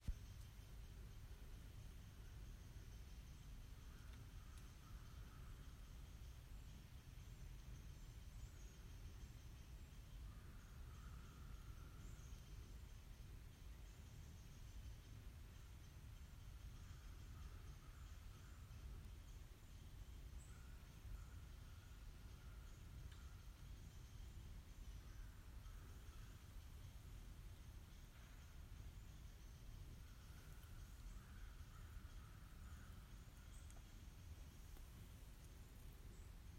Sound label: cuban ivory billed woodpecker